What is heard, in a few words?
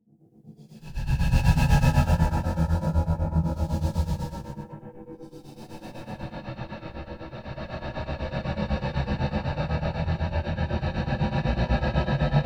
Alien, aliens breath sound design; aliens breath sound design collection